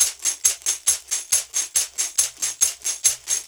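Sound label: TAMB, tambourine; Drumdrops In Dub VOL-1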